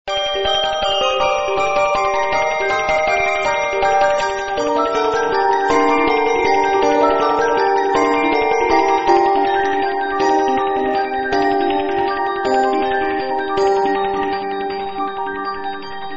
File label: Carol of the Bells (techno)